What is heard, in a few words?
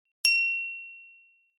ping